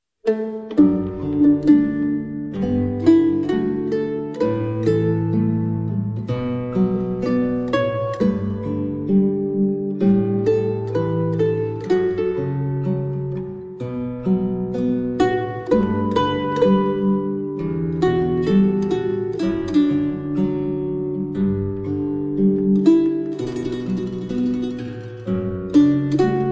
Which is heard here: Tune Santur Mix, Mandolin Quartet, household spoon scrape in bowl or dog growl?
Mandolin Quartet